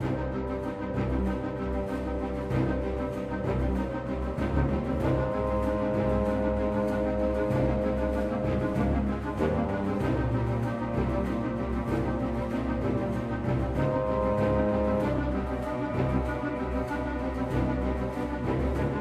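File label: Music Combat Pads; Phantom Doctrine; PS4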